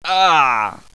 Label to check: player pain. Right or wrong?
Right